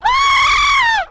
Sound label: mating call